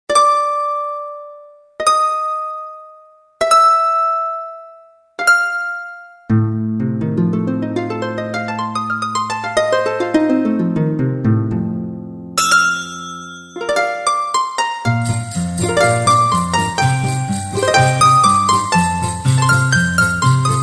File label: Instrumental